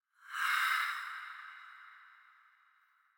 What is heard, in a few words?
Scary Voice